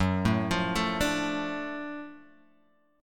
Gb Minor Major 7th Sharp 5th Gb down Guitar Open G